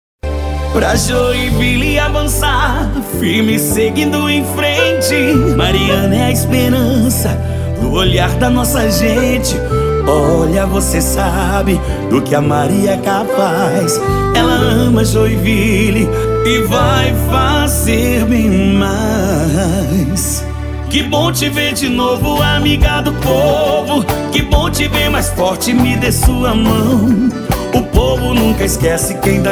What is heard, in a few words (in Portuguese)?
Jingle VEREADORA PRE CANDIDATA, Jingle Político para Vereadora; Amiga do povo; Joinvile